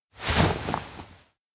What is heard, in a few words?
tilt head up; anemoi